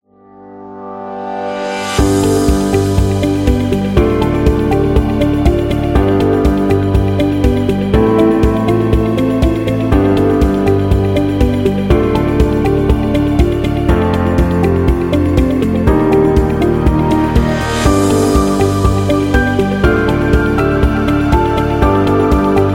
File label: Real Estate Luxury; explainer